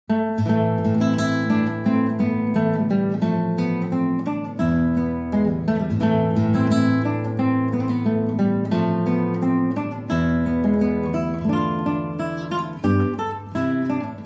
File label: Solo Guitar